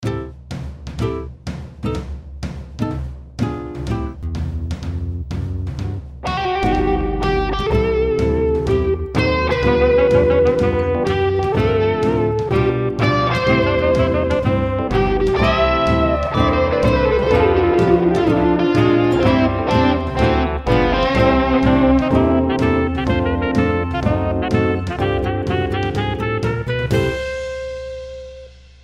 Jazzy Happy Birthday

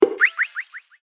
Phone Send

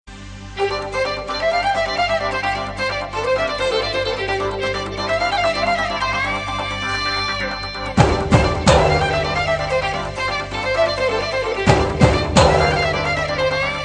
Cool Music

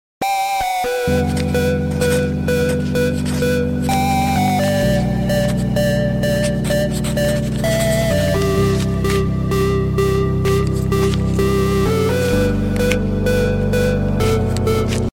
Fiction Sms

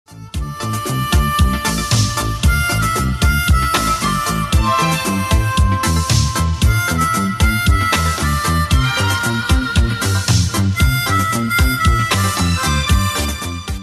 Harmonica

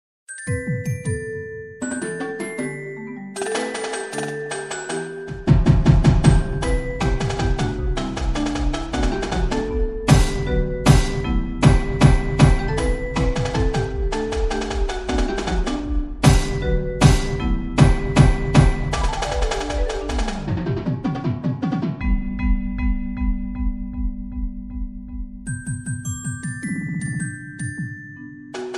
Start Dash Marimba

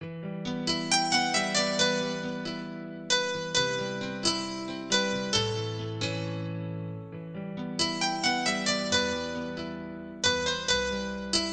New Good Piano

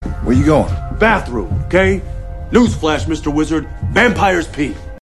News Flash

